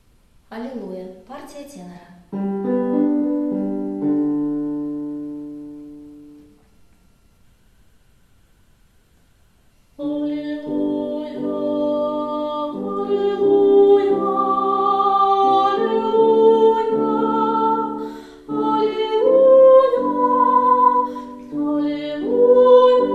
Allilujya tenor; epiphany 2016

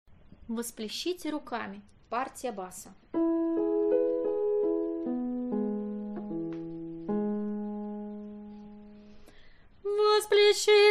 Vospleshite rukami bas

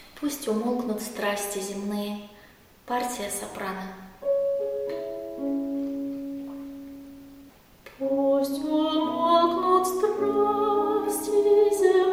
soprano, pust umolknut; Pust umolknut strasti zemnye

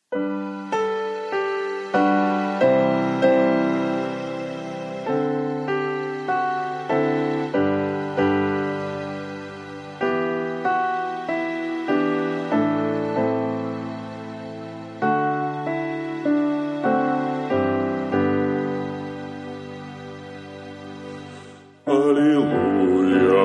bas Allilujya; epiphany 2020

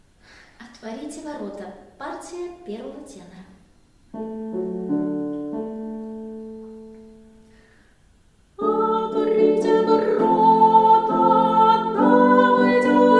tenor 2; otvorite vorota; epiphany 2017

